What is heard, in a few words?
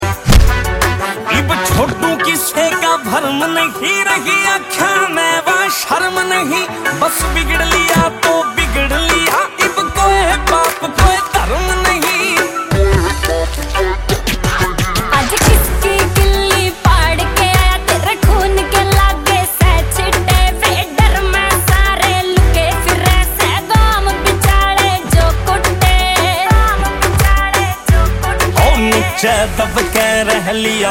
Haryanvi Song